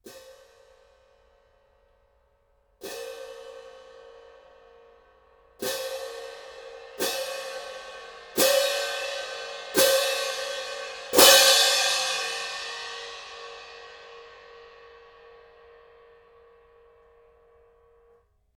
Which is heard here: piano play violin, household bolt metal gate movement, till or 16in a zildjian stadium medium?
16in a zildjian stadium medium